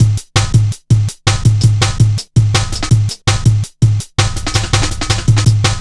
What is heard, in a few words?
165 cushion, Drum Loops; Total Drum&Bass